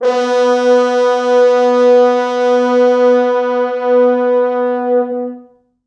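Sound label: 4 FrHorns SUS, French Horns; East West; Quantum Leap Horns Sax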